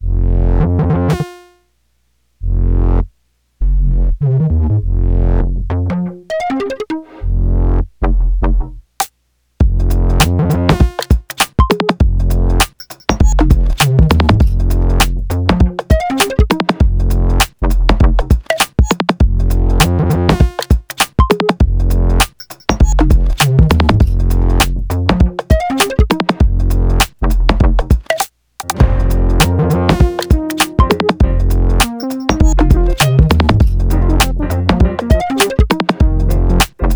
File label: space funk